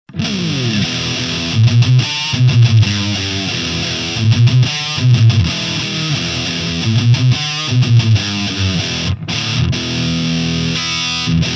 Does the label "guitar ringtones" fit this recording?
Yes